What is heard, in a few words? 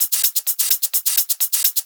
Hihat Loop 128 BPM